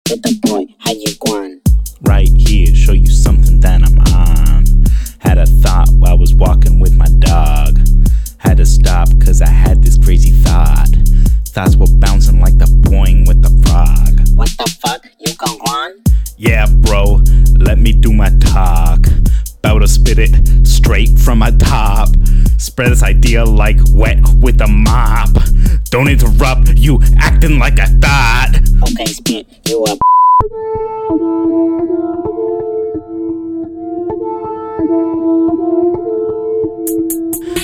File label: Impossible beat